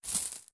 Coins

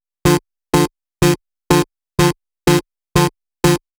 synth 8beats

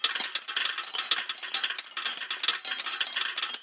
Maze Retro Game Drawbridge Go Down; viewfinder

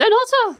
Yelp; Banker Spa

